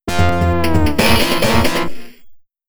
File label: Game Failure; I'm Dead